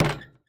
Street Ball Glass Back

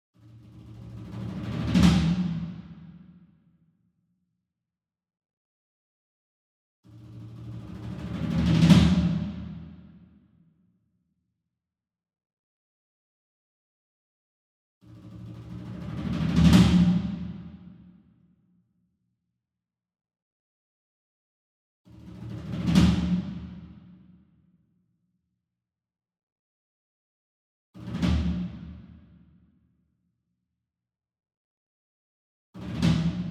Single Drum Tom Concert Roll Hard Beater Far; boom library; Cinematic Strikes Construction Kit

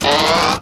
Cartoon Stretch Rack; SFX Kit; Foley - Misc

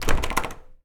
Door Locked Handle Rattle; Doors

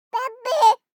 BABIES TALK Boy 12 months Bahbee; Baby Boom

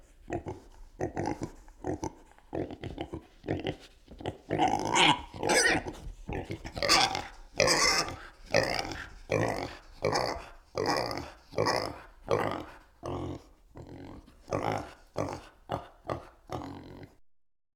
pig; Inquisitor 3

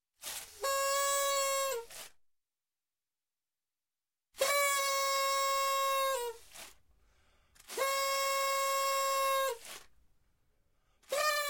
Horn, Party single Blow, Noisemaker; Sound Ideas 2000 Series

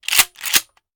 Shotgun Foley; Sound Ideas; Guns

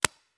Paintball Gun; Sound Ideas; Guns

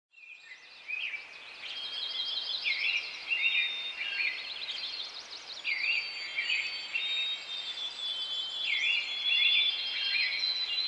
ambience forest; NCH Library

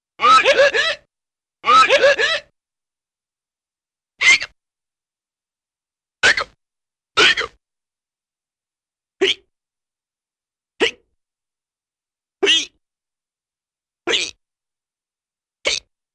Funny Hiccups; Cartoon; Hollywood Edge; Cartoon Trax

